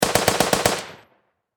Warfare Machine Gun .303 Caliber Vickers Short Burst Distant Perspective